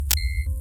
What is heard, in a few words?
bit